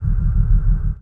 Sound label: starbase strike amb; Sid Meier's Civilization 4 Complete; Beyond the Sword; Final Frontier; Units